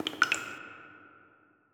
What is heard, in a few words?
Cave drops; 100rogues